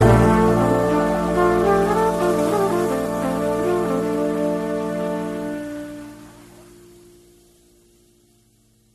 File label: melody